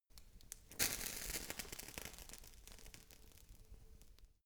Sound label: fire torch sizzle hard